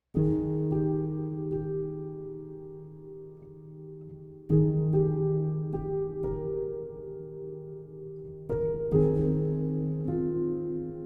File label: A Relaxing Evening; Sad